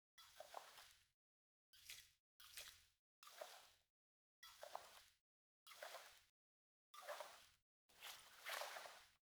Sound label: ui